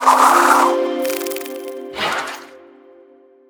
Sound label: sack draw; Butterfly Lovers; wazdan